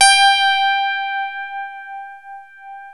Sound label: TINE PIANO; E-MU Formula 4000 Series Vol. 3; Analog Odyssey